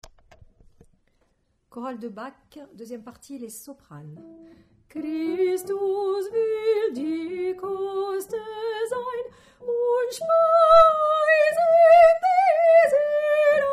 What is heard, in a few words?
Soprano